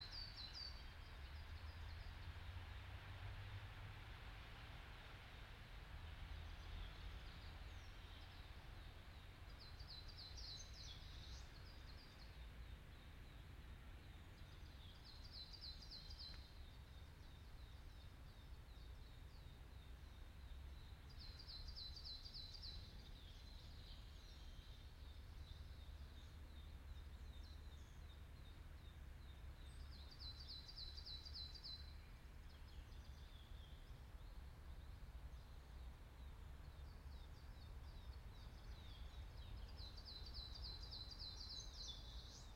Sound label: yamanakako sound